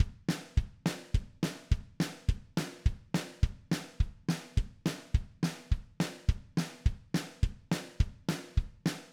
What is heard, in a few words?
basic acoustic